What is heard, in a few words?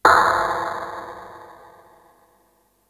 crash, Yamaha RY-8